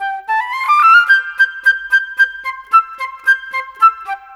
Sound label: Flute, Cinematic; Sonic Foundry (Sony Creative Software); Orchestral Series VOL-3